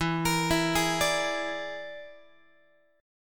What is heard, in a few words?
E 7th Flat 5th, down, Guitar; E7b5; arpeggiated; x,12,13,14,12,13